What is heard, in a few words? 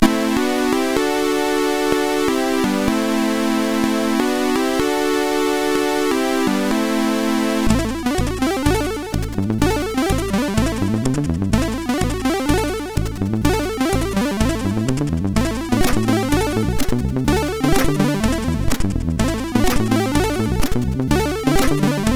Heli, MOS6581R4